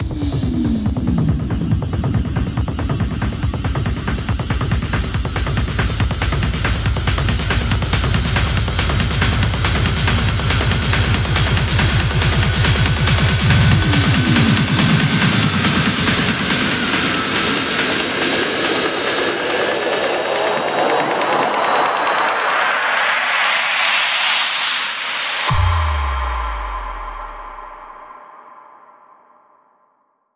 140BPM Zoom 16Bars, Hands Up Trance Fills; Vengeance Samples

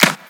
Clap; Vengeance Samples

